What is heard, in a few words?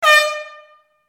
short air horn blast; sound ideas